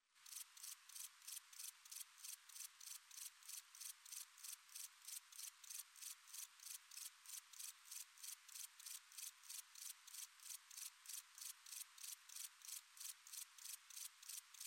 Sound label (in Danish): hedegræshoppe